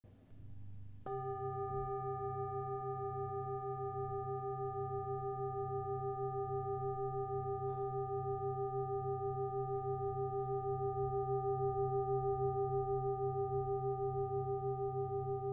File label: Lotus